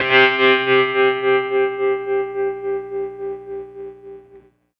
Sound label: Wavy Guitar; Trip-Hop; Loops; Sonic Foundry (Sony Creative Software); Rondo Brothers Trip-Hop Loops & Samples; El Corazon